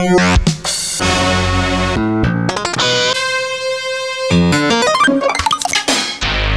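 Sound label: wave sequence, synthesizer; VFX TRANSW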